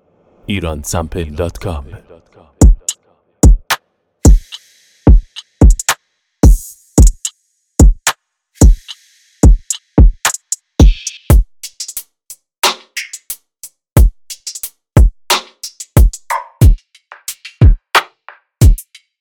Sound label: Trap